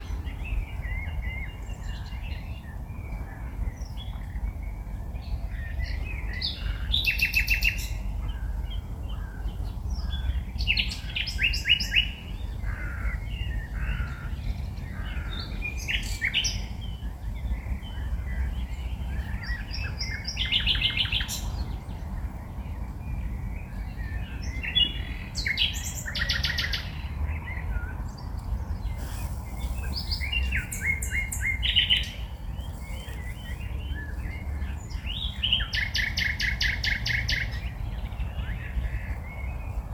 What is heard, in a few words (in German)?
Voegel